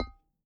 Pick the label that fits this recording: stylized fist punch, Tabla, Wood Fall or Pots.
Pots